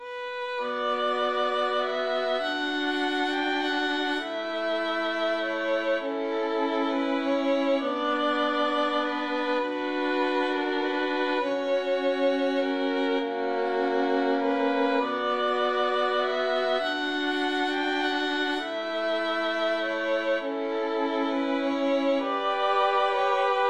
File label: greensleeves vn trio